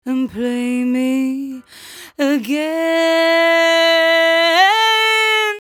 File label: 57bpm Am, Velvet Vocal; Zero-G